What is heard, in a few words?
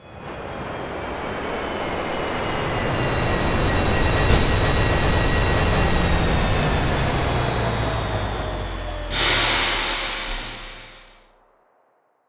Landing ship